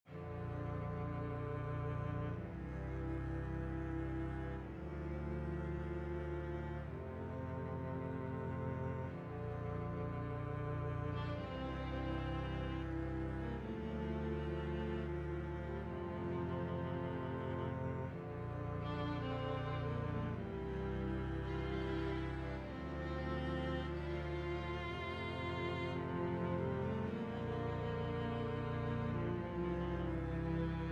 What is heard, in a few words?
F major